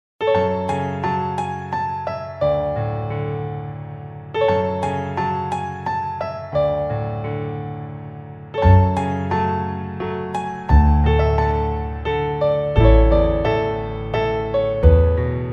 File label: slow waltz